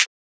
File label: vape clap